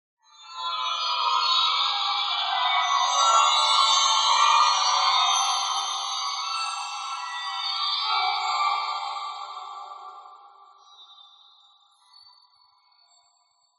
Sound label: whistles swirl complex dissonant magical trails into whistles isolated fade; Skyclad Sound